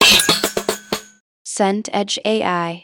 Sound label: hammer metal anvil